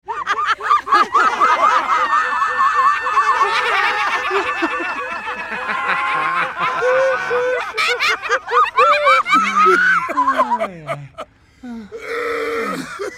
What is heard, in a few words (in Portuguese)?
Loop De Riso, Risadas Individuais